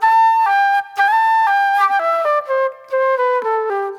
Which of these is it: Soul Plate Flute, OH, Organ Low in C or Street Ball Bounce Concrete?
Soul Plate Flute